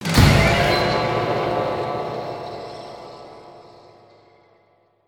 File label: Music Stingers - Battle Stinger